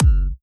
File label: Kicks, Elektron MachineDrum SPS1 MKII